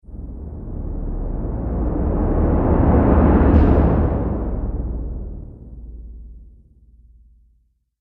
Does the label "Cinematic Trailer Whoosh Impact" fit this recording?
Yes